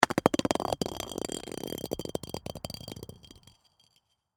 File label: Ice Object Roll, Across, Ice; Texture; Rolling (Sound Effects:Objects)